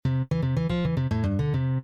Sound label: start; Criss Cross 81; wazdan